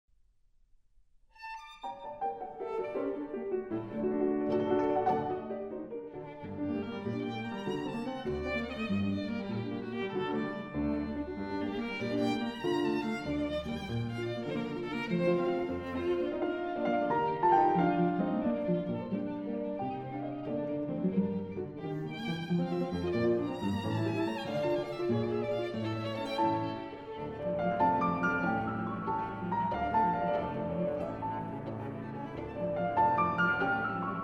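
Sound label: piano trio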